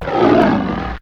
drake hit; wesnoth

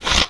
pincers; wesnoth

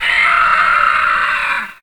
lich die; wesnoth

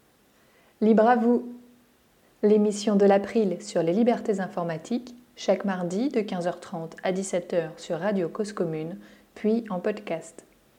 jingle

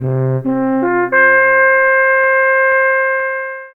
horn, horn signals; wesnoth

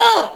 human female hit; wesnoth

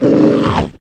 wolf big bite; wesnoth

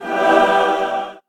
magic holy miss; wesnoth

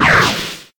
magic missile; wesnoth

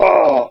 dwarf die; wesnoth

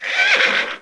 horse hit; wesnoth

